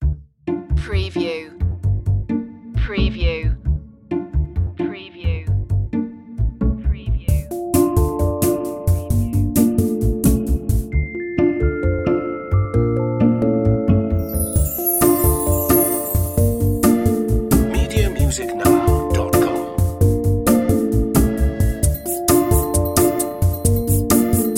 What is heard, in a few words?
piano lounge underscore version